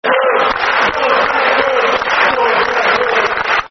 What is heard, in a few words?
Georgie Best, Football Chant; Man United